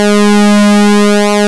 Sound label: 101 PWM; INIS - Vintage